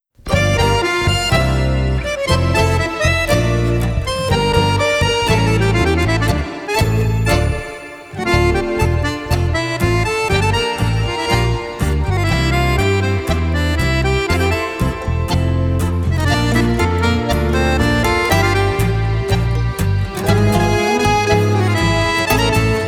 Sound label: tango